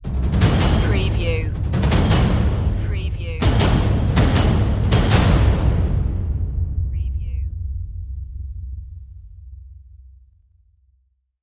jungle ritual stinger

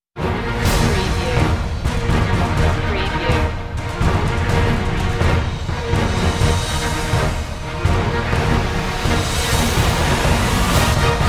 sting no choir

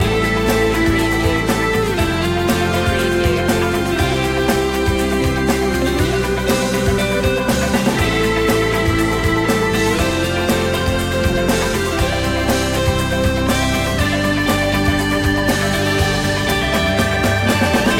corporate fever loop

